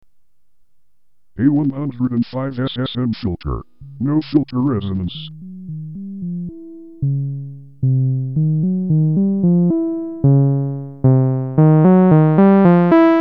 SSM Filter Resonance

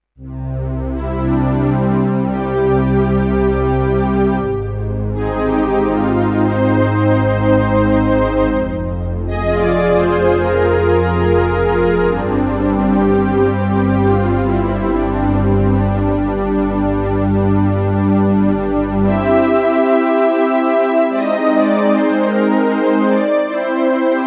JP8 Patch A

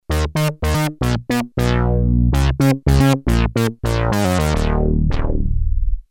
P600 Pan Unison Bass

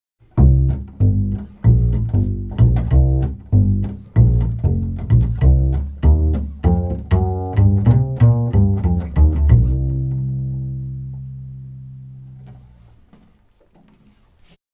UT fet 47 Double Bass 70 cm f hole

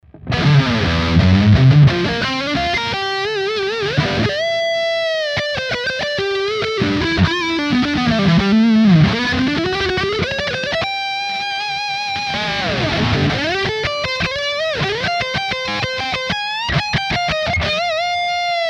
Les Paul Leadsound; Slash Signature

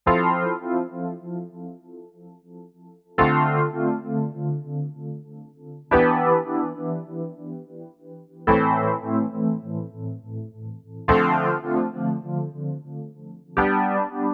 E-Piano; Kurzweil KaE1

